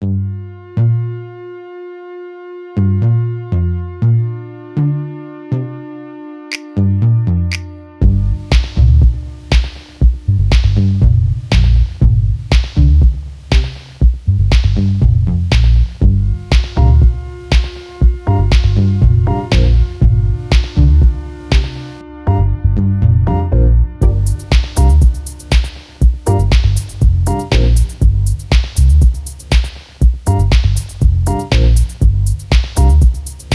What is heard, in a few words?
Dream State; Anger Music Library